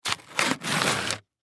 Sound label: Needle Open, Needle Open Package; Surgeon Surgery Four Inch Disposable Bone Marrow Biopsy Aspiration; Hospital; Emergency